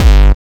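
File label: hard bass drums; Bazzdrumz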